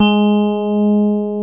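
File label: G#3 DX Rhodes; Northstar - New Gold VOL-1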